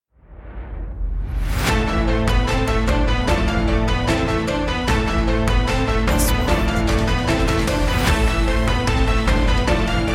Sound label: action